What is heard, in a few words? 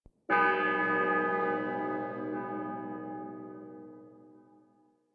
Courtyard Intro Bell; Cinematics; Warframe; Duviri Quest